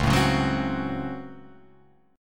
C sharp Major 7th Suspended 4th Sharp 5th C sharp, Guitar Open F; strummed; chord; 1,4,1,1,3,1